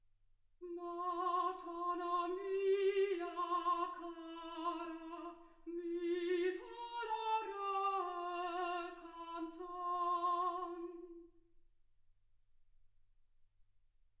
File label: soprano